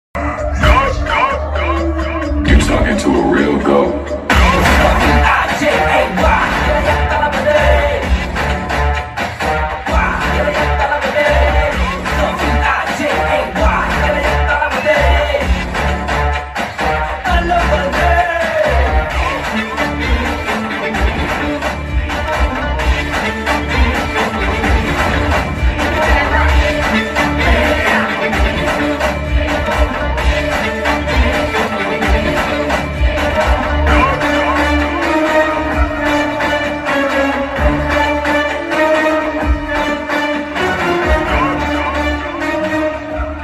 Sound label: cloning ultra hq bgm ringtone; thegoat; ilaya thalapathy; vijay